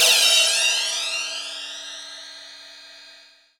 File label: PROCYMB, CYMBALS